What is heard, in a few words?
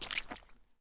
swamp walk; Escape from Tarkov